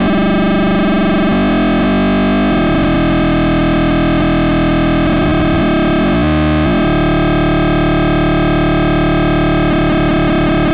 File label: 2 step sound block